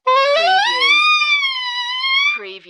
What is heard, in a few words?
Sax Funny Run